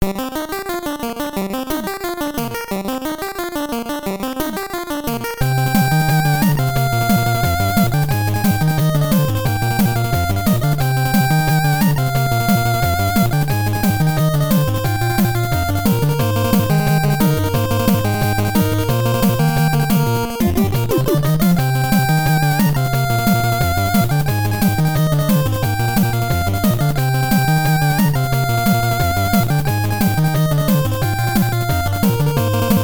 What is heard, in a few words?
bgm; OnionOS Themes; Onionboy HD Gray